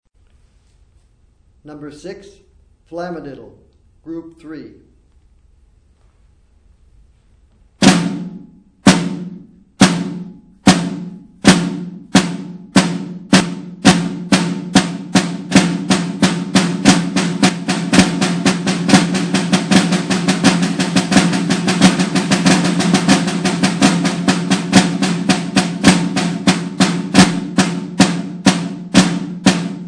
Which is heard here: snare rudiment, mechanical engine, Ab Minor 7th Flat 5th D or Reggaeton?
snare rudiment